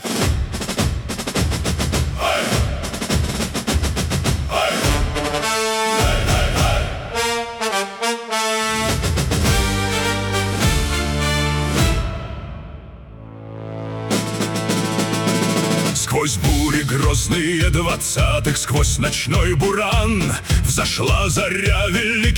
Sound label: hymn of power engineers march